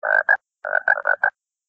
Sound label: Motorola Edge Notificacao; toque de celular